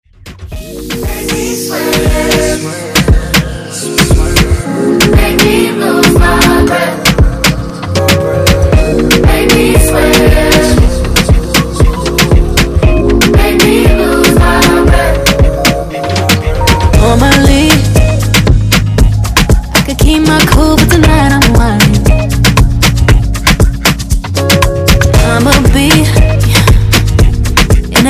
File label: Reggaeton Redrum